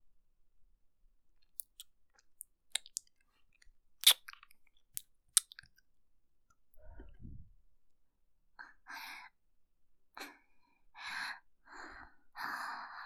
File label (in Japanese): キス; 【マゾハーレム】